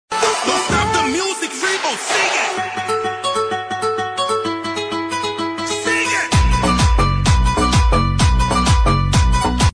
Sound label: Trending Music